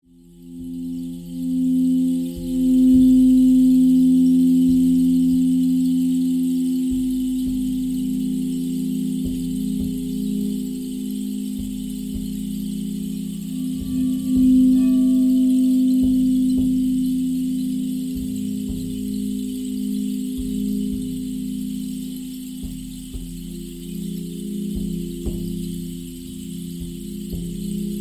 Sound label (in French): baton de pluie bols et tambour; voyages sonores